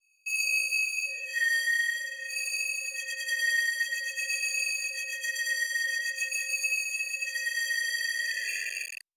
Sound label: granulized glitch